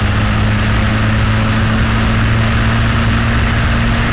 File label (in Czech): autko ch; Mafie 1